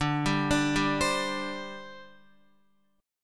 D 7th Suspended 4th, down, Guitar; arpeggiated; JGuitar

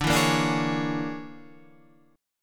A sharp 7th Sharp 9th Flat 5th D

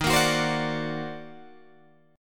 Eb Minor Major 13th, Guitar, DADGAD

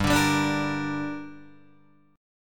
G Minor G down Guitar Open D, chord

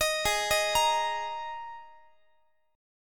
Gsharp Suspended 2nd Gsharp Guitar; arpeggiated; 4A,4E,5A,5D,5G#,6C#; x,x,18,18,19,21

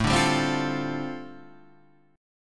G 7th Suspended 2nd Suspended 4th A

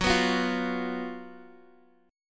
Gb Major 7th Suspended 4th Gb Guitar DADGAD

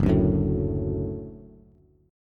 Gb Minor Sharp 5th Gb Bass; strummed; JGuitar

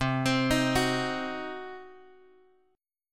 C Diminished C; Cdim Chord {X 3 X 5 4 2} arpeggiated

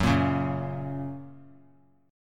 F sharp Major; strummed; JGuitar

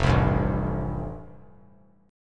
F Major Add 9th; Fadd9 strummed; JGuitar

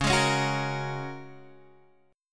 G sharp 7th Suspended 2nd Suspended 4th

